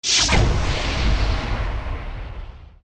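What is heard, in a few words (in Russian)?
Падение со вспышкой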